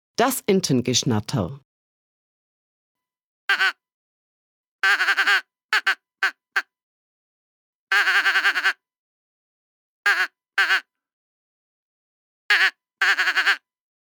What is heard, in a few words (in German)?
Das Entengeschnatter